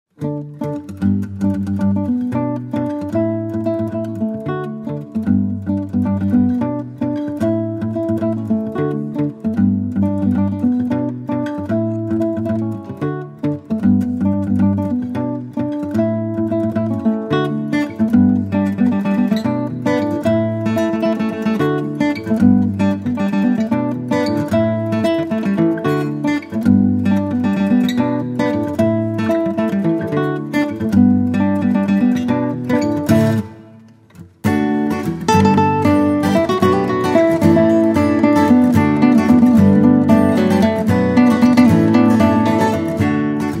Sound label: african roots